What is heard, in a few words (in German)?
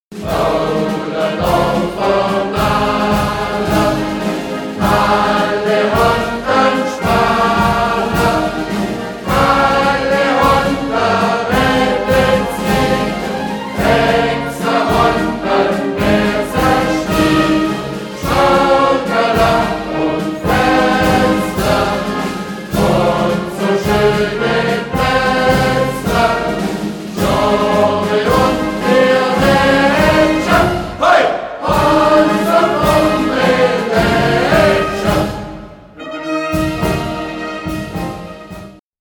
narrenmarsch gesungen